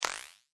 Click Plastic Sequnce Phased Click, User interface feedback sounds; Clicks; Multimedia